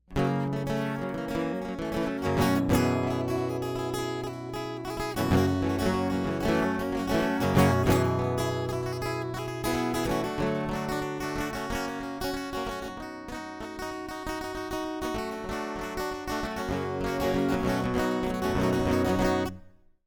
acoustic guitar

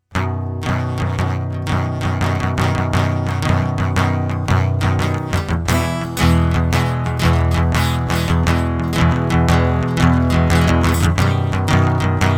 acoustic

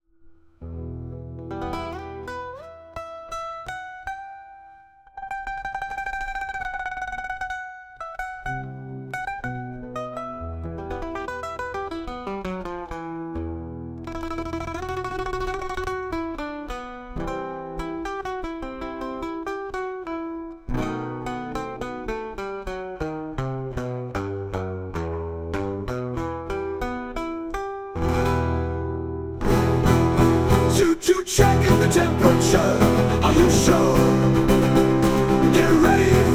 Interlude

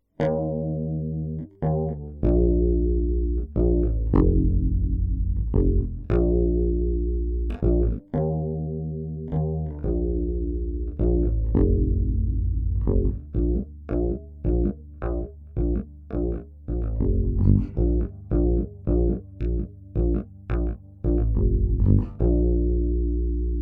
electric bass

